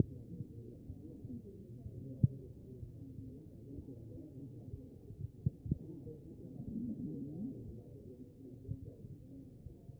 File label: CHEST; B MODE